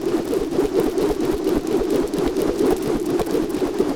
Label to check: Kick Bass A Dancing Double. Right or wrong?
Wrong